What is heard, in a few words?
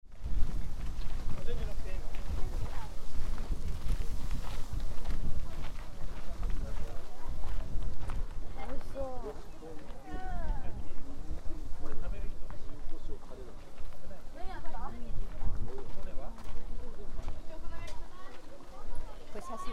parking back; shinobuyama